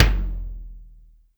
Tom Lo, Drum Machines Clavia DDrum-AT Pitched Down